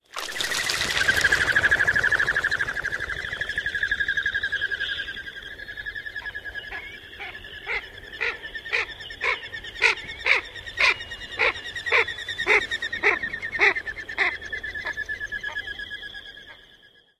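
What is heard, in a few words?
flight whistle and quacks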